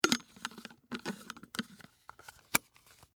Thermos Screw On, Foley, Thermos, Lid, Screw, On, Close; Objects; BlastWave